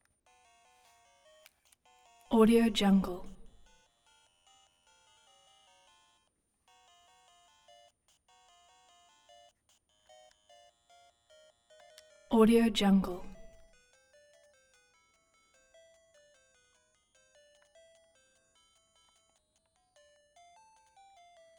game boy 8 bit hand held video game

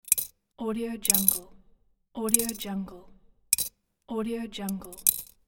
coin in piggy bank

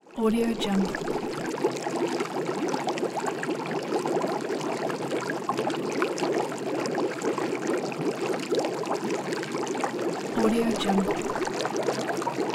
water boiling

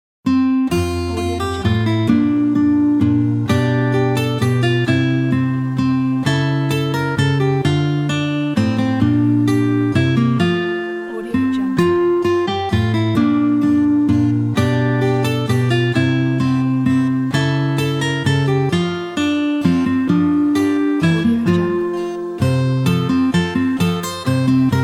we wish your a merry christmas guitar

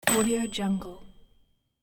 bicycle leans against a wall in the forest

